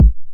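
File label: 909 BD tape, kick drum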